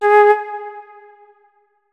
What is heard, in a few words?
flute notes; Hideout Furniture